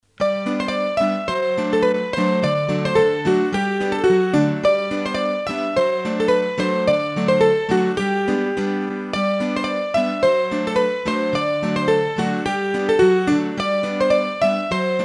Bourree, hurdy gurdy; Bourrees a 2 Temp

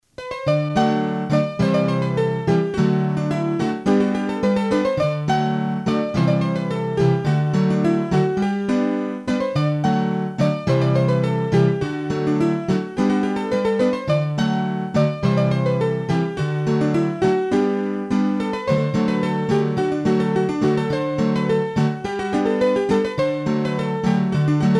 Bourree, hurdy gurdy; Bourrees a 2 Temp

